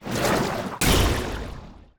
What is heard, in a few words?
water golem strike; Shelter